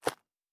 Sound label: Dirt Run Surface, Footsteps